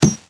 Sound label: grenade bounce earth; weapons; Modern Warfare